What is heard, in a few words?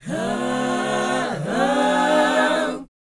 BOYZ G, Vocal Planet, R&B Dance; Spectrasonics Vocal Planet